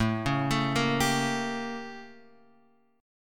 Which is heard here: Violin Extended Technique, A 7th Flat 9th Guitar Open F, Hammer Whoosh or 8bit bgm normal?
A 7th Flat 9th Guitar Open F